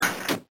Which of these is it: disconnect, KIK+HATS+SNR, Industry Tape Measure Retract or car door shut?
car door shut